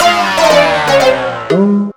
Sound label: failure; tf2ware ultimate; music game; ds touched warioman